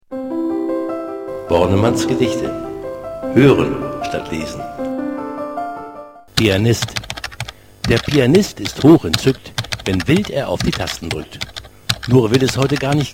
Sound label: pianist